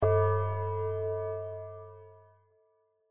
ガーン ショック 鐘の音

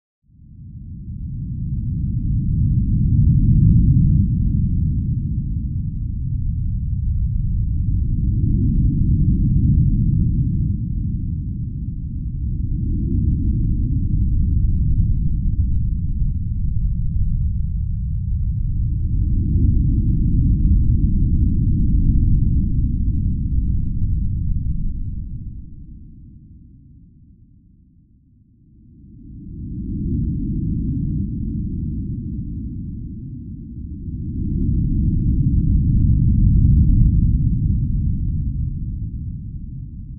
雷雲 雨無し

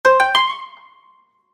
場面切り替え 琴 和風 遅め; 場面切り替え 琴 和風 その１ 遅め

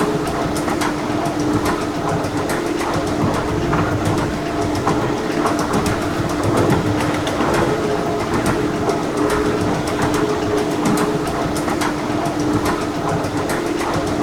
エスカレーター

